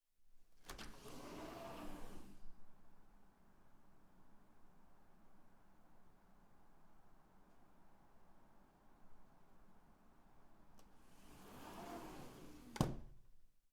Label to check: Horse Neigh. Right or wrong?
Wrong